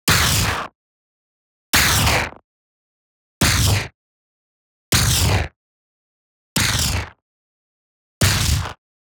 IMPACT WHITE NOISE Distorted Noise Gritty Small Zap; boom library; Anime Essentials